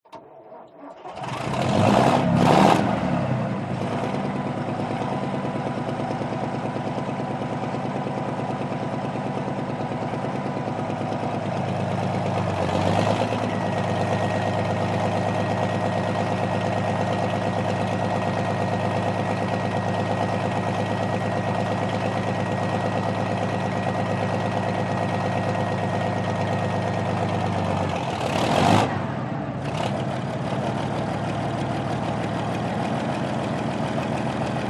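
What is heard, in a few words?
warfare tank matilda mark 2 1939 engine start idle warm up revs increase onboard; ext above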